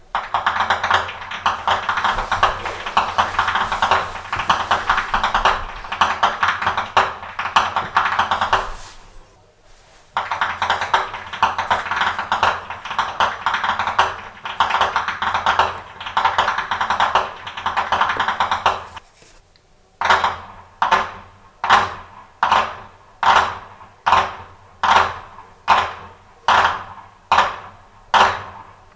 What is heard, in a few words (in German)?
schnalzen; saaldorf